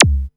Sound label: ZAPP; ELECTRIC-SET